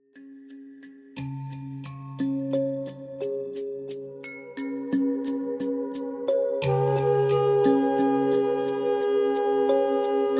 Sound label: zen far east sounds